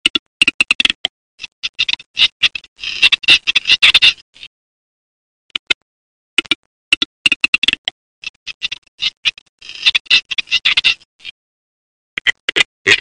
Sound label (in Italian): Gallo cedrone